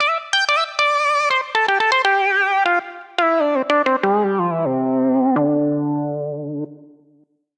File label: Multi Solo (Dm) 126bpm; Music Loops; Singularity Multi Solo; Ableton; Factory Packs; Singularities